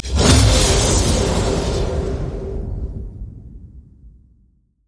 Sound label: level up; LoL